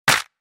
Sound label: clap